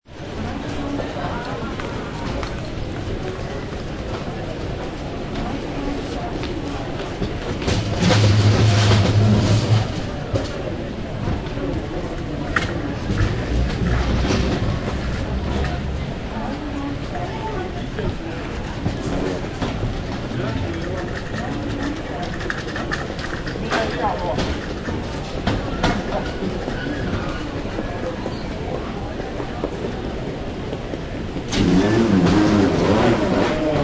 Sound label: Crowd Airport Ambience Near Gates, People pushing trolleys; People pushing trolleys, very faint voice saying 'mind your step', announcement s